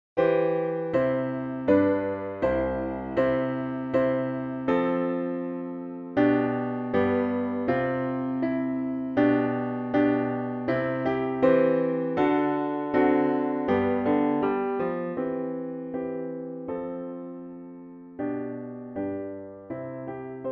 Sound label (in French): gavotte